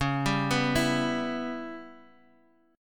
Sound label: C sharp Minor 7th Flat 5th; x,x,x,x,1,2,1,1